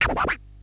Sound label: Scratch